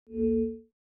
Windows Balloon

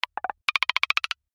Message

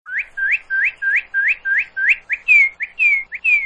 Cardinal

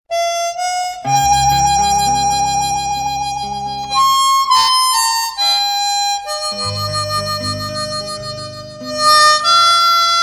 Awesome Old Tone

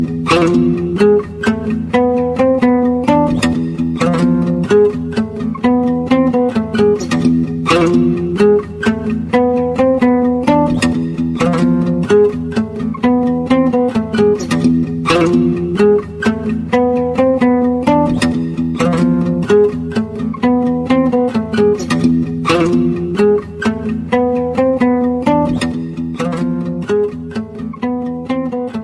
Guitar

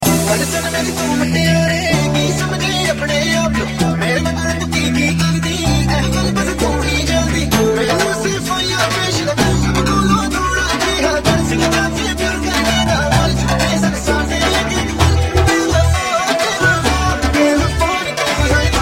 Dhol Mix

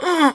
Ahhh

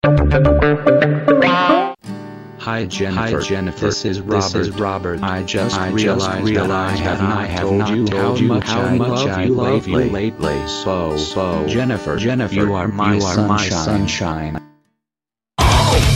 Echo

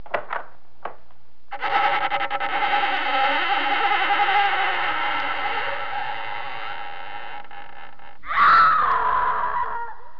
Woman Scream

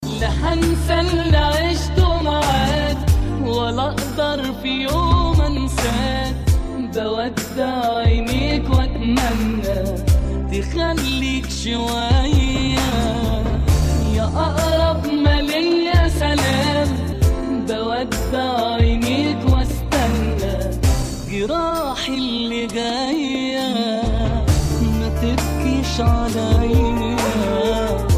Arabic Sad super Sad

